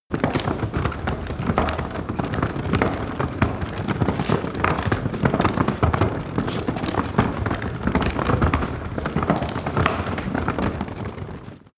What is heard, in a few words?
cart medieval drag fast